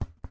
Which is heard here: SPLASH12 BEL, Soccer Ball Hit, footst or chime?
Soccer Ball Hit